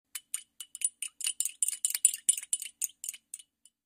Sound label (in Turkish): Vestel Venus blacktea bildirim sesi